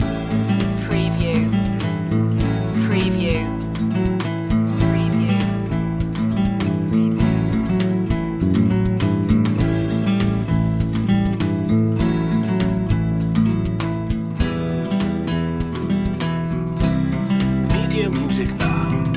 outback ramble loop

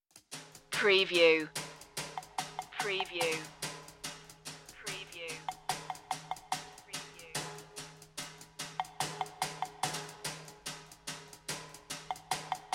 no strings woodwinds

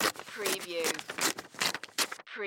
Notebook Tear Paper

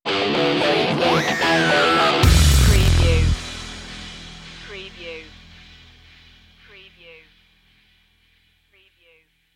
beat stinger

